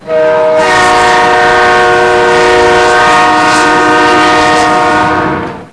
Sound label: HORN; mtm2demo; PC Gamer 4.2